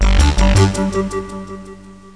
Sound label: open; Pretty Good Solitaire 7.20